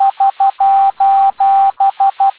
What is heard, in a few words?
SOS morse code